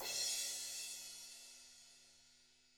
BRUSH CYM; ILIO; Double Platinum Drums 1

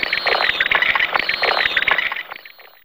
Nyep, Fairlight CMI IIe

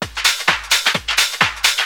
130 addon, DRUMLOOPS, HIHAT

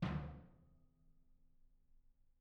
R B Floor Tom B Room; Sonic Foundry (Sony Creative Software) - Drums from the Big Room The Mixes; R&B One Shots

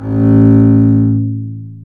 STR ARC BS, Cb Bowed, Cb1 mf vb; String Master Series

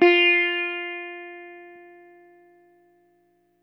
EL SITAR HRD; Quantum Leap - Guitar & Bass

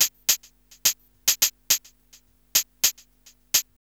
Burning Grunge Hip Hop, RNB 106; USB Soundscan vol.34; AKAI

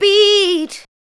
ADLIBS 90; Ueberschall; Jam Box

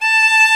STR VLN, Str Quartet, Strings

